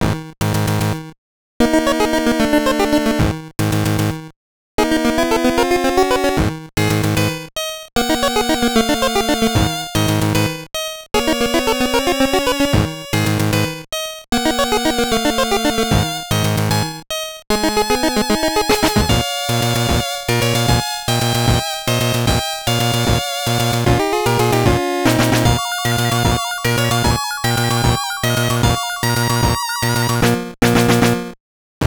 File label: Suspicious Mood; Rockman EXE N1 Battle; WonderSwan Color